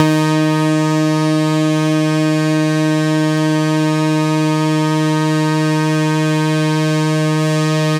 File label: Moog Bomb Lead D-3